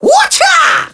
Alarm Clock

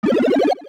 Poison Mushroom Appear

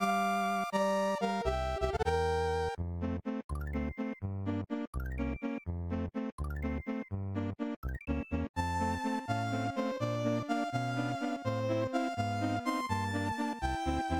Swimming Theme; Underwater BGM; Super Mario World; Super Mario Maker

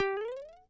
Super Mario Jump Low; SMBW

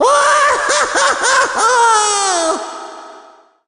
Luigi Death Scream

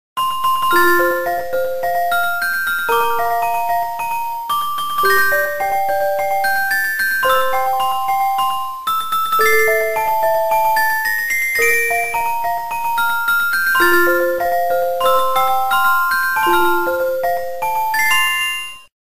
Game Over theme; DKC3 GBA

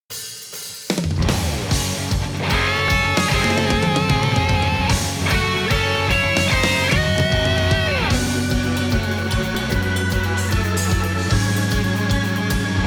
Castle BGM Arrangement; NSMB

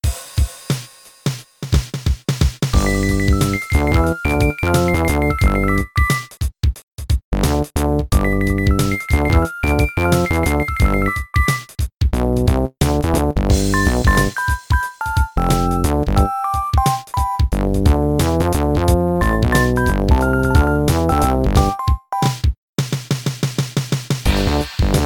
Menu, The theme that plays in several menus; MvDK2

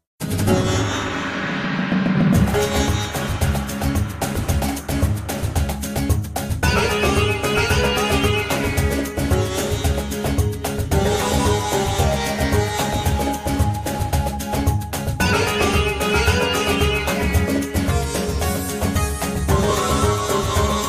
Music; MK8; GCN; Dry Dry Desert

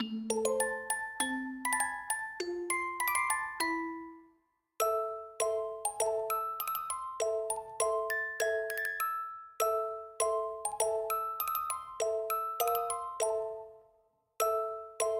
Desert Night; SMM2; SMW

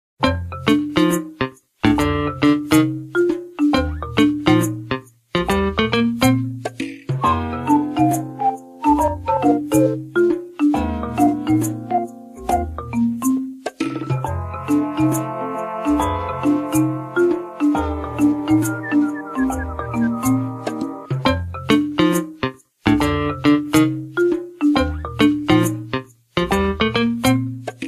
Soda Jungle Theme; NSMBU

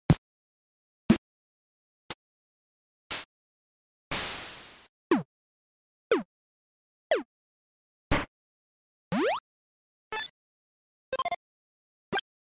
8Bit Drums Instruments

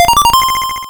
Red Coin; SMBDX

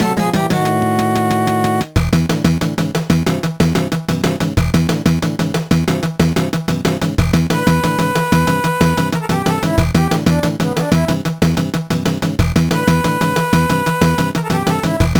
Fortress Boss

